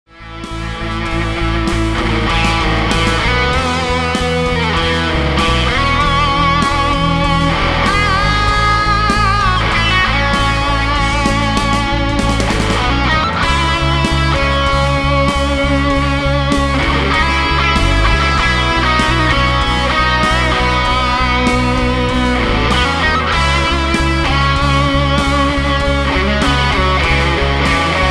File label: LEAD, amps